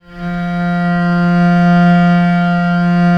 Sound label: VL F2, VIOLE, Historical Instruments; USB Soundscan vol.23